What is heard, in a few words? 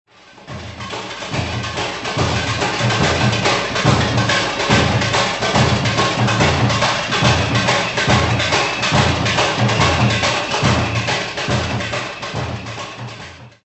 tamborim